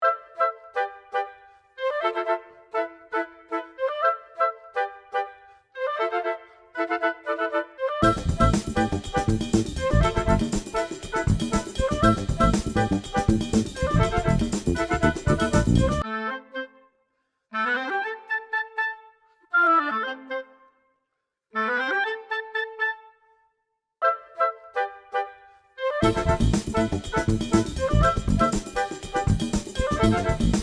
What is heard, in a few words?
jingle